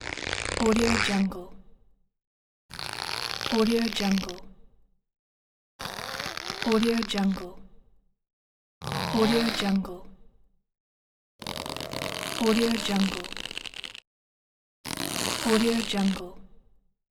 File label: rubber stretching